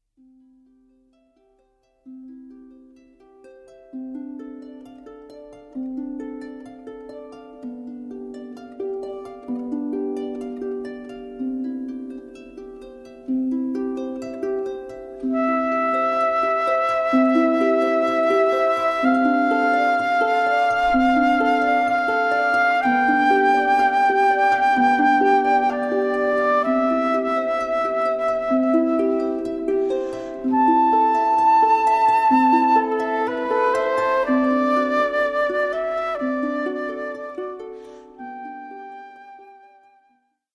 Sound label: Ave Maria Flute And Harp